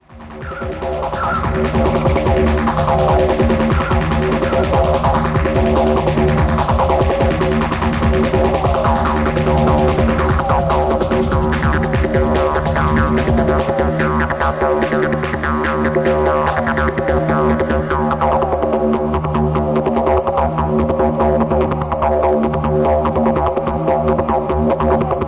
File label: Didgeridoo